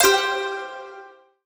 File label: ultrafast win; DragonsLucky8WD; wazdan